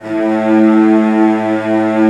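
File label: CELLOS B2, Strings; ILIO; Synclavier Strings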